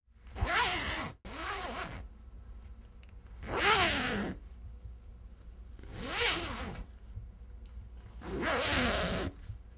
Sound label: Zipper